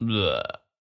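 "yuck" sound with male reaction to bad-tasting food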